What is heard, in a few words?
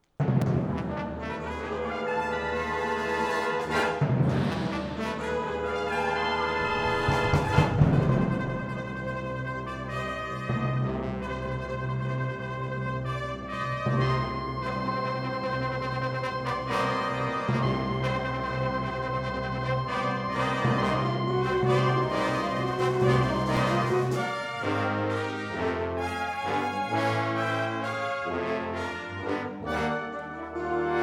Fanfare, Wind Symphony; La Crosse Wind Symphony